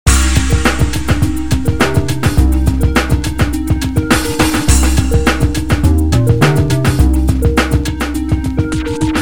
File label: depressing rock music